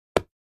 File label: Dart Hits Target, darts